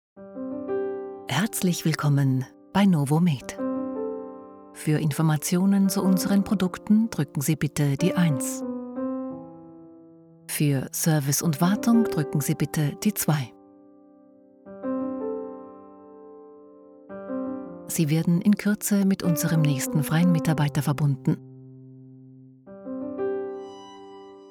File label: Piano; Novomed